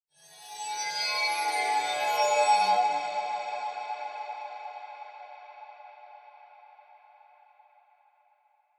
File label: Horror Accent